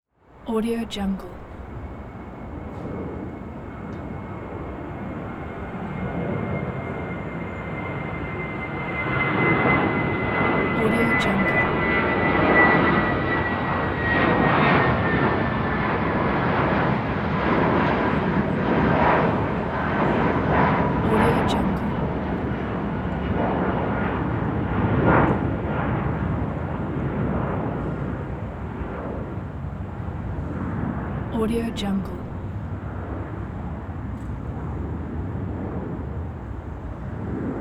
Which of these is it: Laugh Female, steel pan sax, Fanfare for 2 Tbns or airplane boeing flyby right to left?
airplane boeing flyby right to left